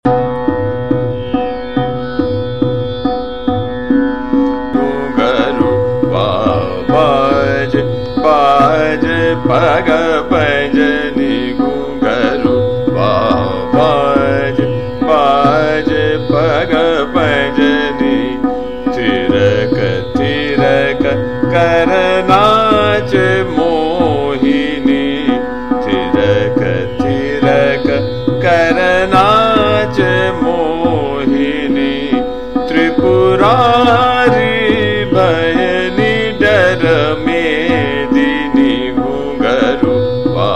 Chota Khyal, Trital; Jaijaivanti; Ghungarwa Baje Baje

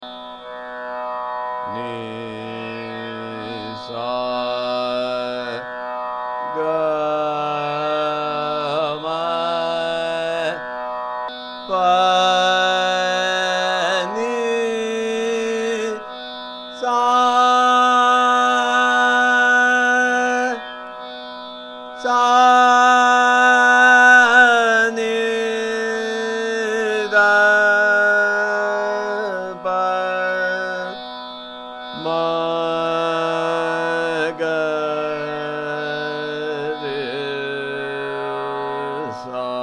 Bheempalasi Aaroh Avroh

